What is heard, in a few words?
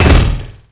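tank death; quake2; railz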